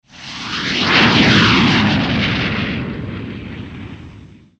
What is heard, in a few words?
soaring by; portbot